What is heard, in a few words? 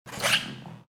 steps on rubber